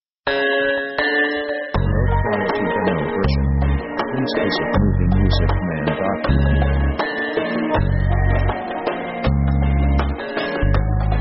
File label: musical the tramp